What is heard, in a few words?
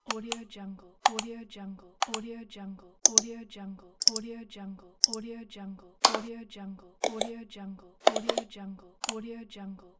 mouse click pack